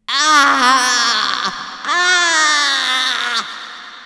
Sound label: haunting; sven